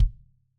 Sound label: Kick Dry Mono